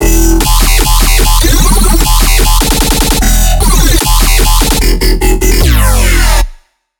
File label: E 150 Scraper, Dubstep Bass Loops